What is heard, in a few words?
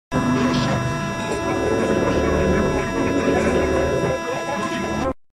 radio conection siren